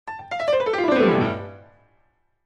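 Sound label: Grand Piano Short Descending; keyboards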